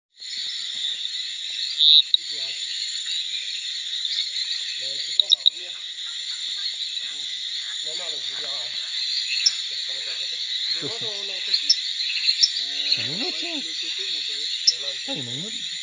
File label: Dendropsophus counani